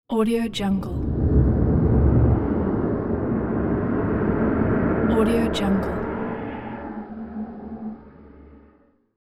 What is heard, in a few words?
underground horror lair